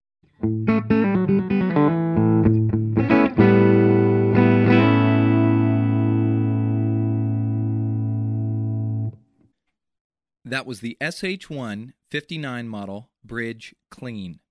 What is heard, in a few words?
clean